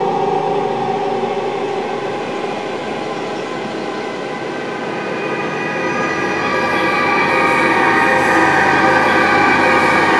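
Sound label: ATM HORROR, Production Effects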